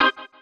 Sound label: Key organ; Luxembourg Zwee